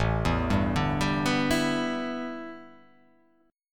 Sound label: A 9th Suspended 4th, A9sus4 chord; arpeggiated; JGuitar